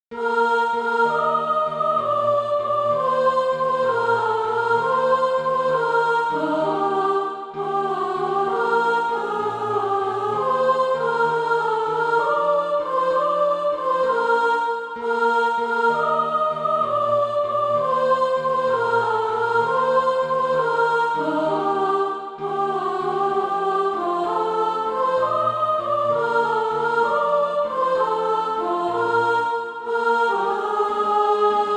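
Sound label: soprano, Practise Music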